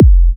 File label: Minimal Kick, Drums, Kicks